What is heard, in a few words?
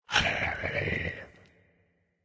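Movement Purr; smite; god bakasura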